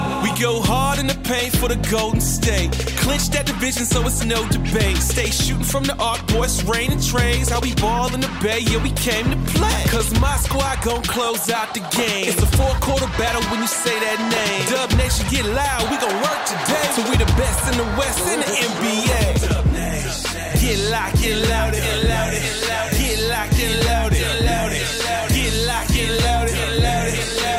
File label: Dub